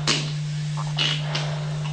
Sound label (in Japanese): ガッツリフェラ